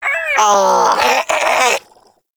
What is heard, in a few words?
Armadillo Vocal Death; Characters; Warframe; Hunting Critters